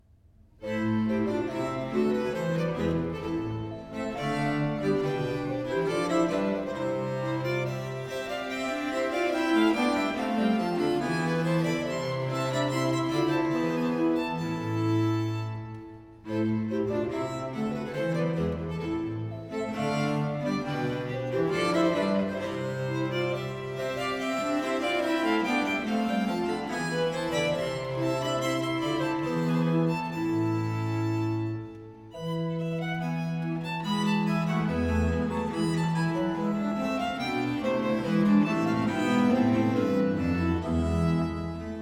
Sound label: consort sett a 5 in a minor aire, viols